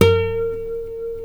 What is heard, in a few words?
B NYLON, Guitars